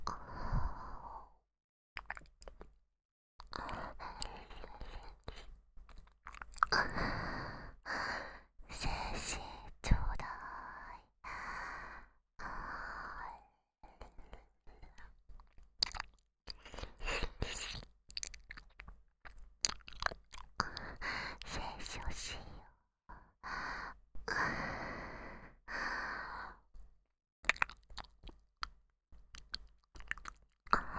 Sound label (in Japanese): 耳舐め 喘ぎ; 精子ちょうだい♪; 深雪